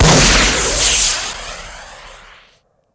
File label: rocket, weapons; dod 1.3; dod enhancement pack